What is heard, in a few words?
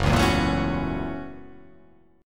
F sharp 7th Sharp 9th